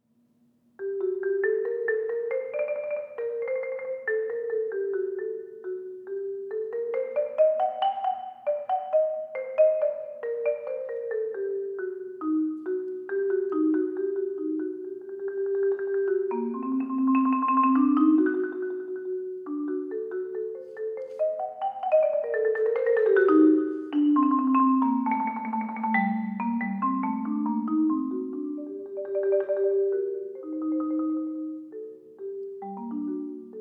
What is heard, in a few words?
Mallets